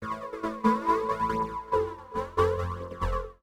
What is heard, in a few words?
TEKNO 140; Techno2